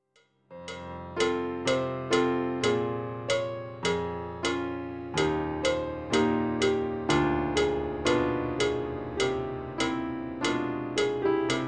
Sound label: midi piano